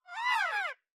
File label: Cry; Mitty